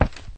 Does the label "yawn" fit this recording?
No